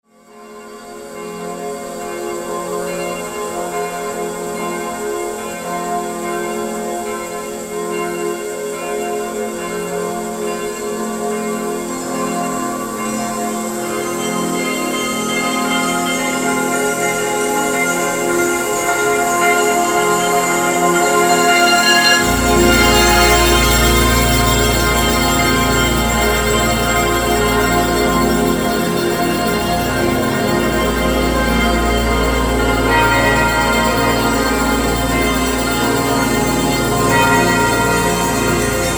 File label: Bell Fantasia